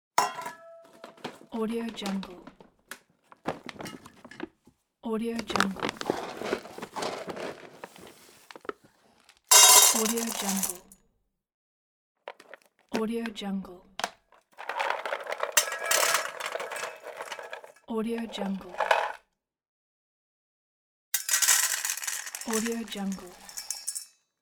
pouring dog food

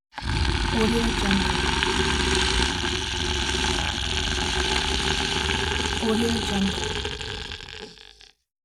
scary monster growl

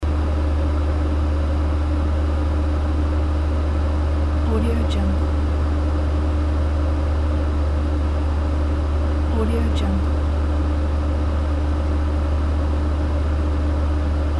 engine loop

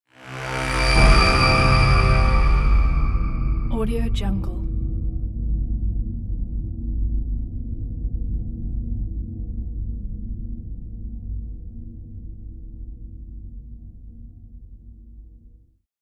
battle futuristic horn

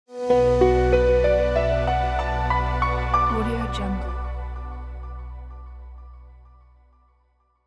elegant ambient logo

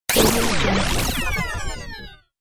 scifi resonant glitch; fxprosound

